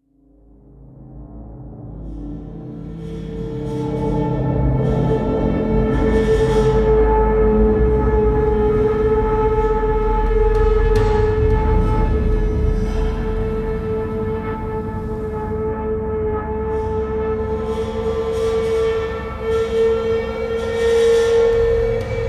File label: Abrasive Tension; Mad Max